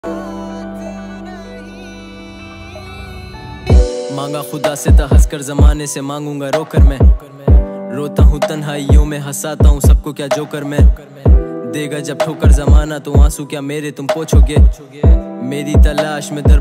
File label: hindi rap ringtone